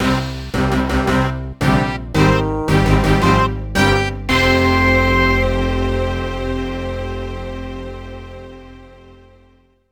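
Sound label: encounter sting announce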